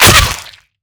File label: flesh impact bullet; physics; Counter-Strike Global Offensive